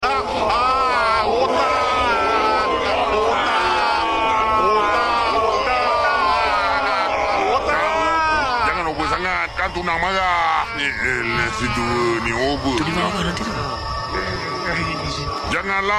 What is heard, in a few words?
zombie